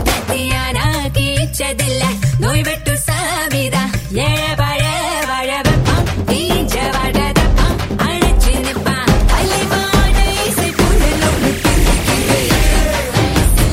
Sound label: Female Ringtone